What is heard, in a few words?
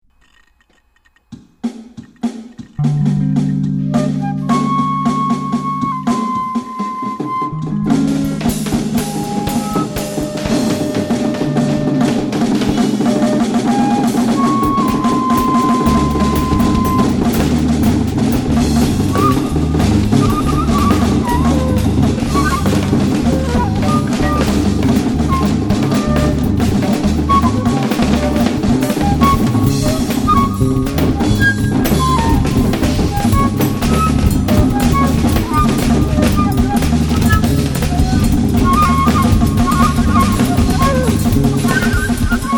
free jazz